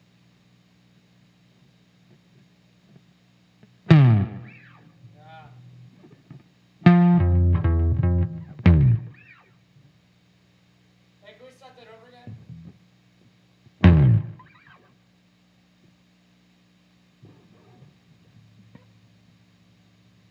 bass slide

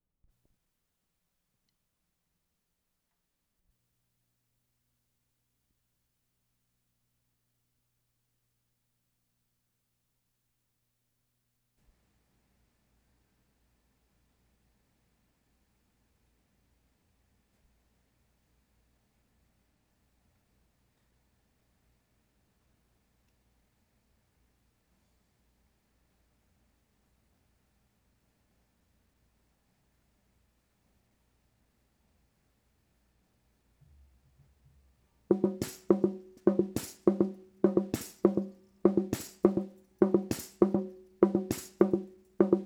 HAND DRUM

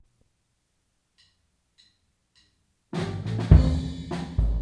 kick

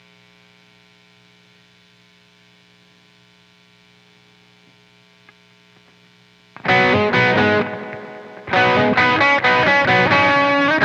guitar

